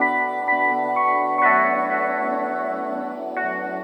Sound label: Drone Keys; Sonic Foundry (Sony Creative Software); Synchro Funk